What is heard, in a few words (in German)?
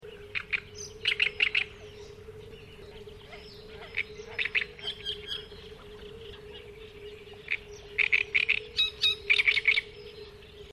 drosselrohrsaenger